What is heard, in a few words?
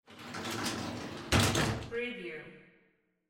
shower door